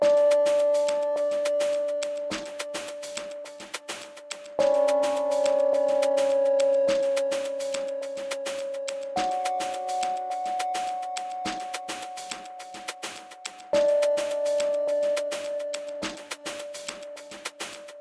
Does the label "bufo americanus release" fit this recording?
No